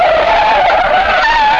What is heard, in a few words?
Slip; Racing Days R DEMO